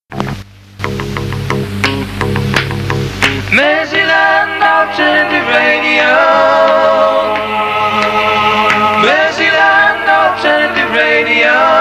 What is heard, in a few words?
jingles